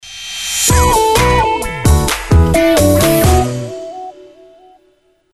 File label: dance tag